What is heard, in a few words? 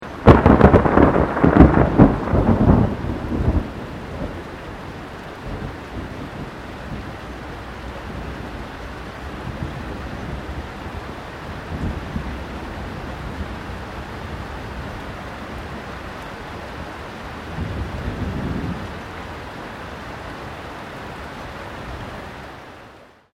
THUNDER CLOSE RAIN